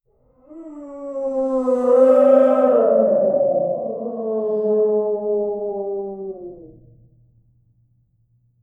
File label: eerie soundscape of two; zombie